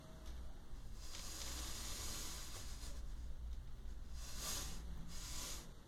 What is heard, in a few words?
Rope